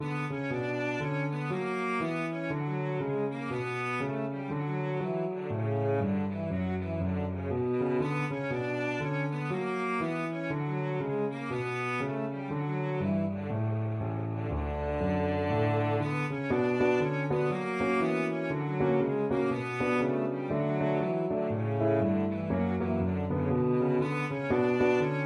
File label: bouree, cello